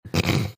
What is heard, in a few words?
snorting